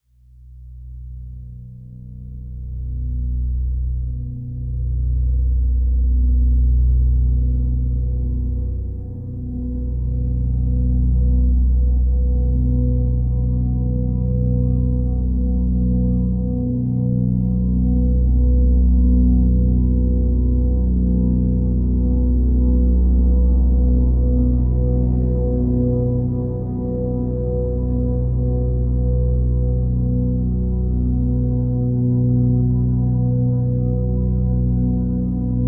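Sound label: Drone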